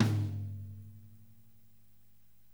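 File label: LO TM, drums; DRY KIT; Spectrasonics; Burning Grooves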